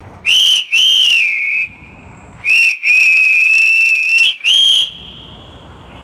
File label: scattered short whistle blasts echoing